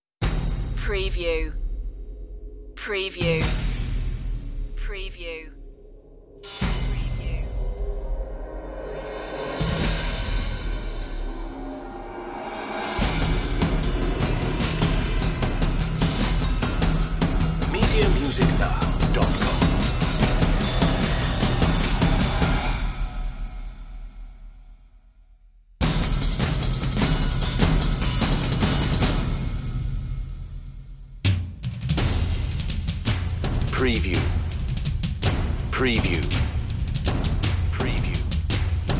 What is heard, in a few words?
percussion only